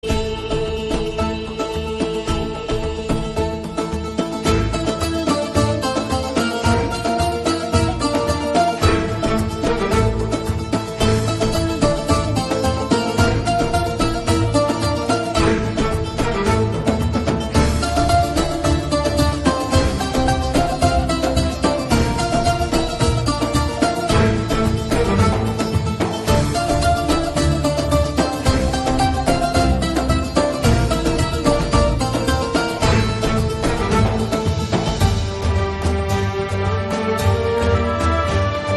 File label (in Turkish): telefon zil sesi; dirilis ertugrul